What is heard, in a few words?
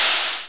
fum poisonoff; enforcer; quakemapping